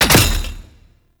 Grenade Launcher Dry Fire; Warframe; Scaldra Grenade Launcher

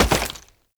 Step Rock, Foley; Warframe; Oraxia

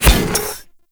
Step Default; Warframe; Corpus; Ambulas; Footsteps

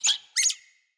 Floppet Double Squeak, Animals, Vocals

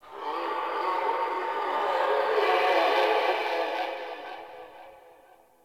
Distant Creatures; Warframe; Venus Open World; Venus Landscape; Ambience

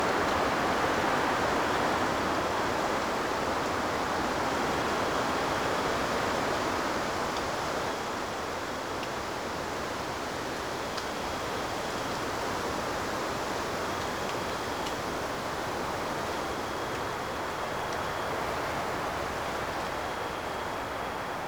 Forest Exterior Breeze Amb; Warframe; Ambience; Grineer Forest

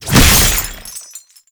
Catenach Chain Latch; Roathe Summon; Warframe; Tau

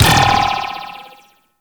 Ball Bounce Goal Post; Warframe; Tenno Ball; Missions

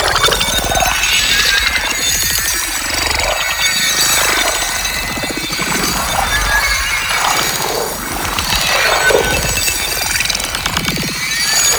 Paint Spawn Active Loop; Gameplay; Warframe; Shadowgrapher

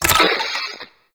Play Next Video

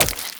Wall Jump Flesh; Warframe; Tenno; Wall Run

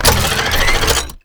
Long Gun Alt Fire Charge; Warframe; Weapons; Thano Tech Arch Long Gun

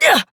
Jump Adult Operator; Warframe; New War

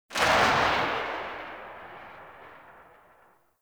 Rifle Tail, Weapons; Warframe; Tenno; Gyre

